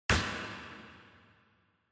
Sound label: Motion Grab Reverb